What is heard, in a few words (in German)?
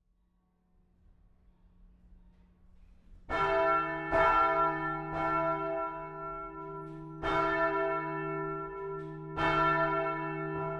Glocke II; st. paul furth